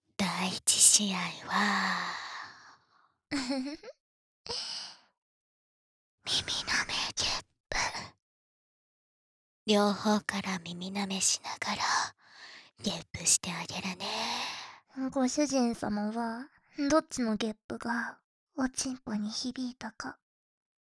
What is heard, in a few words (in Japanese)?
W 耳舐め ゲップ; 種付け希望ギャルメイド×2のゲップ誘惑合戦